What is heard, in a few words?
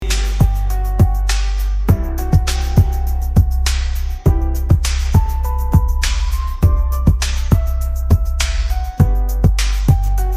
background music